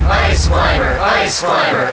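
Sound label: Cheer, crowd; Ice Climbers; Melee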